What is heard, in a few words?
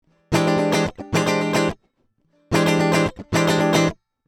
RME UFX Git Funk High Impedance